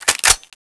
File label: aug boltpull, weapons; cstrike